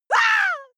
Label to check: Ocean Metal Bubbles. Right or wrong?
Wrong